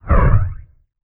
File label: aura in; garrysmod